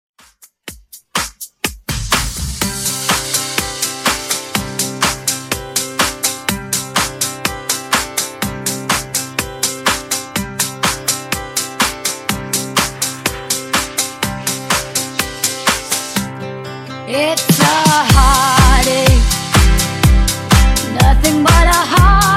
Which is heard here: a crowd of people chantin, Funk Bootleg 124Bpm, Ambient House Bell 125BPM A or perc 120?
Funk Bootleg 124Bpm